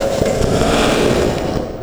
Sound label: FX Loop, Drums & Drones